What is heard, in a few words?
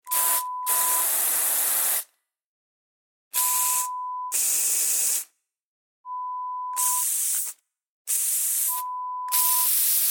Aerosols